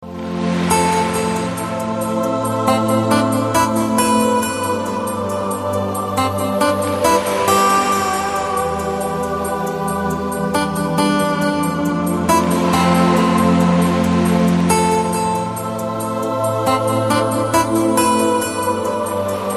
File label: Beach Vibes